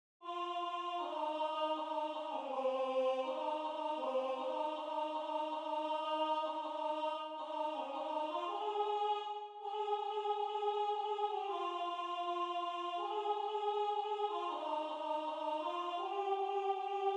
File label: Soprano; Includes lyrics